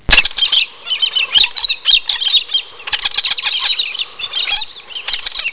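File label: Galah, Cockatoo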